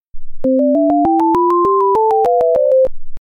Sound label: snap